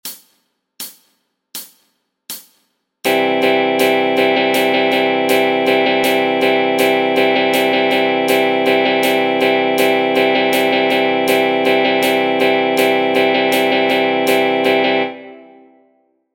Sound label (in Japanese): ドラム と ストローク